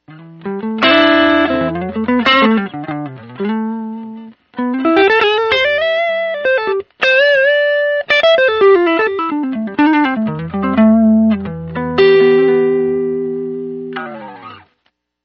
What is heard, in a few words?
class A crunch